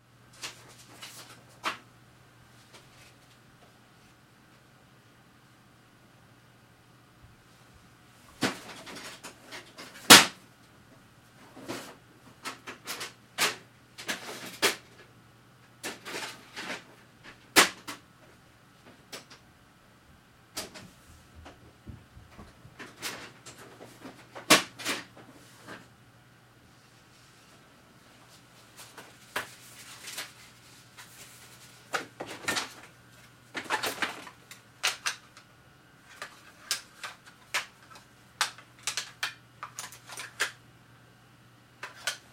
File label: Activity Laboratory Office Light; Lite Moves; Gadget Clicks; Hospitals; Big Room Sound